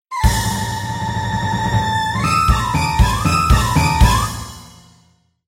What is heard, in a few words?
Battle Start; Chop Sushi; Android; mobile